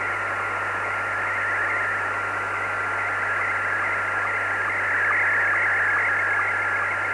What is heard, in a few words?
sstv